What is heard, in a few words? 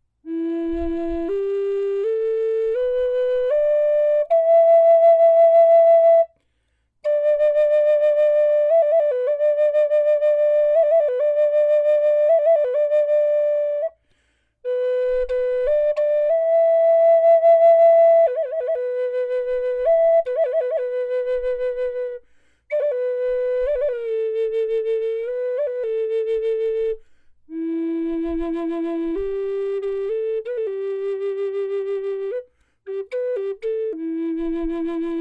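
natural, wood flute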